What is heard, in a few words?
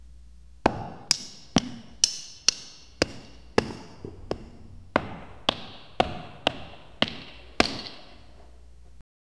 cutting chicken meat with, food